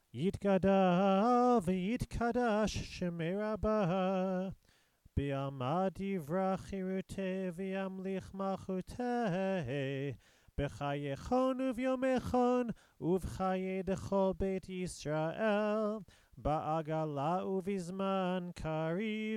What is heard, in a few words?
Chatsi Kaddish Before Musaf